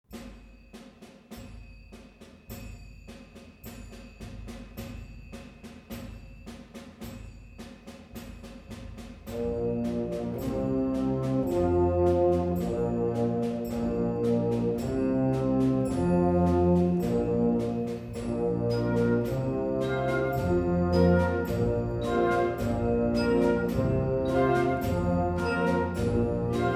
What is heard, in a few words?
a classical canon